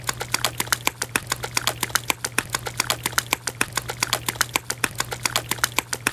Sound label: sploosh